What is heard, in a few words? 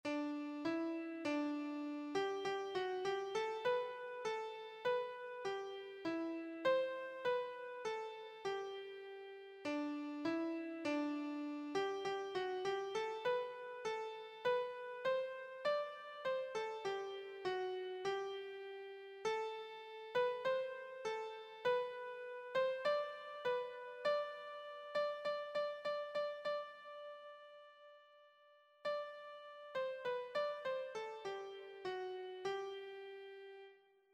cwm rhondda, keyboard